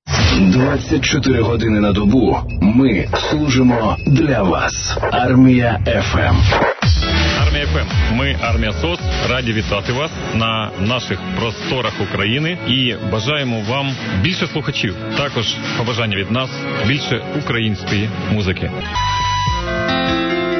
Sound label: interval signal; armyfm